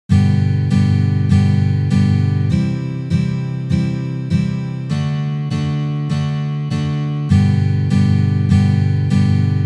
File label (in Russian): Uprazhneniya dlya igry akkorda Sol mazhor na gitare